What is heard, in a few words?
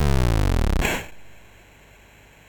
sid MOS6581R2, GAMES; Face Off